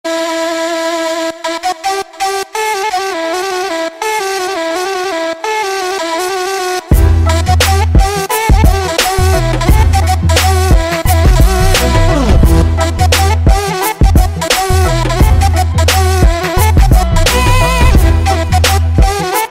Flut zil sesi

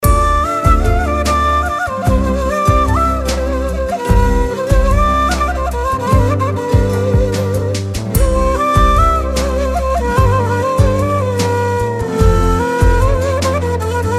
mukemmel zil tonu

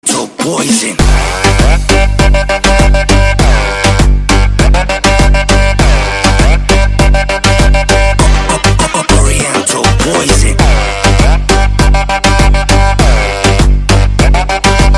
Oriental Zil Sesi

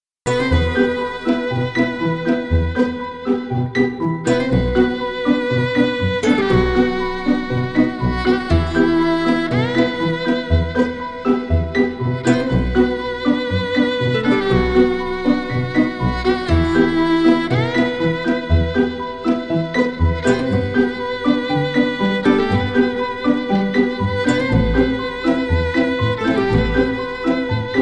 Keman; Isler Gucler

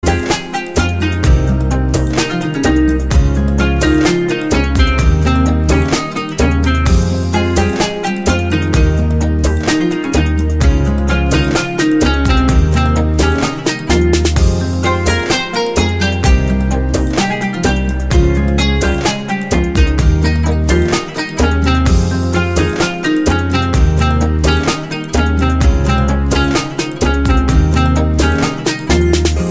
Harika kanun sesi

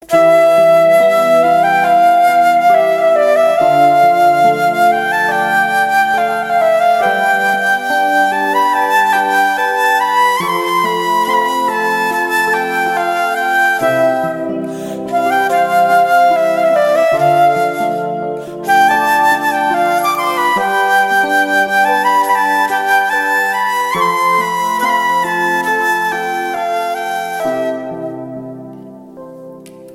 Flut

